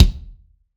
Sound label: BD, BASS DRUMS; EdgeSounds